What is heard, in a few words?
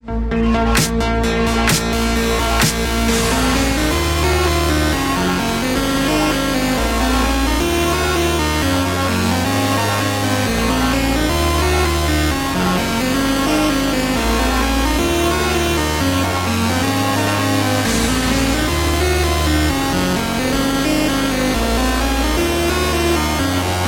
Disco Rington